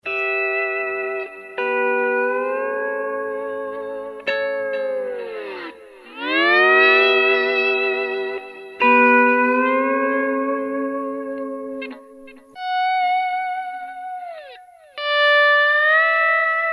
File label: Pedal Steel